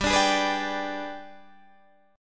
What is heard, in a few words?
G sharp Minor Major 7th Flat 5th